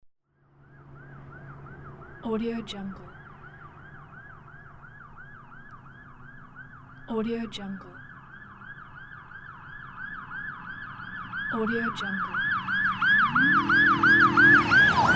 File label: police with siren speeding by; urban sounds